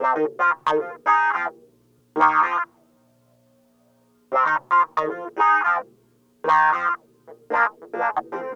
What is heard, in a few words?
Raw Funk Clavinet; Sonic Foundry (Sony Creative Software); What it Is! 70s Analog Funk